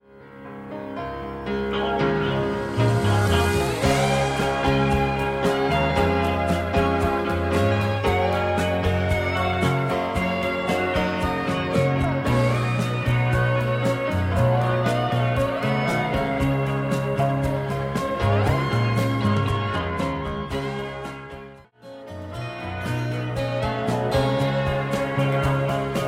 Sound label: leslie